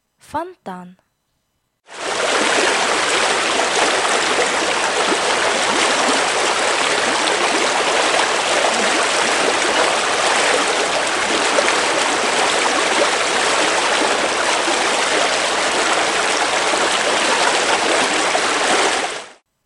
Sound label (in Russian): Фонтан